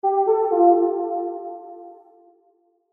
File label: Chime Musical, Chime Musical Multimedia